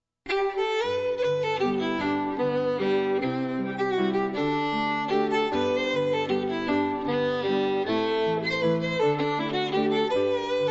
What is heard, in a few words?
waltz